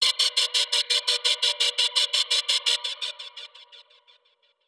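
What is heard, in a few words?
alarm siren, modulation processed, 170bpm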